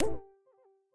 Ring Off; Game rip; MiSide

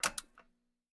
Lamp Switch; Game rip; MiSide

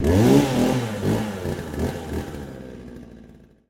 Chainsaw Out Door; Game rip; MiSide

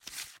Show Cards; Game rip; MiSide

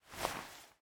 Drop Player; game audio; Mita; MiSide

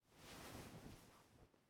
Movement; MiSide

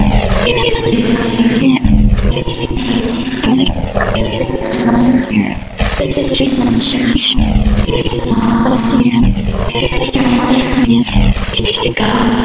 Creepy; game audio; Mita; MiSide

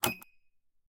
Button Safe; Game rip; MiSide

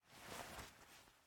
Hand Ring; Game rip; MiSide

